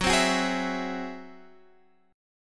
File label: G sharp Augmented 7th